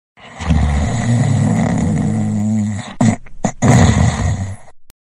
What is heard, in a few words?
snoring